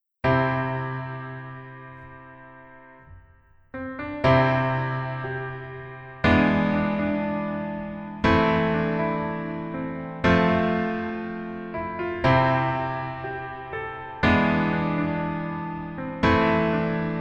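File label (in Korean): Piano version 멜로디 MR -1키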